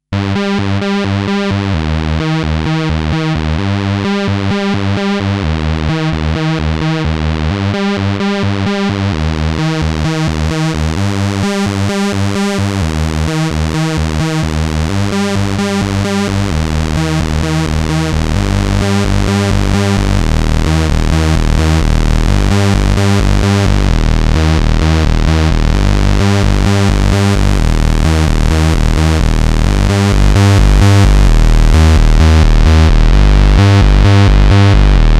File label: Jen PWM, Jen SX1000 Synths